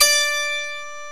HARPSICORD

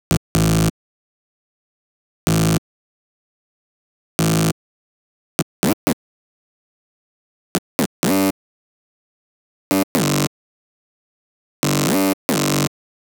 Bass, Afterhours EDM, Electro Shock

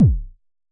Unce Unce Kick C1, Vermona Kick Lancet; LookIMadeAThing Kicks

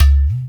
UDU; Spectrasonics; Heart of Africa

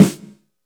CHUBBY PIC, snares; Instruments & Percussions; InVision Interactive; Lightware VOL-1

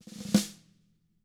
ROLL, SNARE ROLLS; ILIO; Double Platinum Drums 1

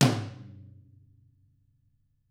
TAMA TOM HIT; ILIO; Double Platinum Drums 1

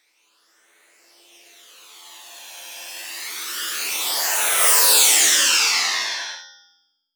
Breakdown FX, Underground UK House & Electro

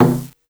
Perc Wadaiko Casio SA-1

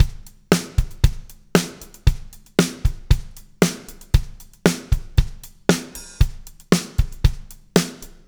MED POP, Backbeat, R

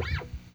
JAZ NOISE, guitar; Quantum Leap - Guitar & Bass

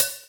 Open Hhat, HiHat; Equipped Music; Loungin House

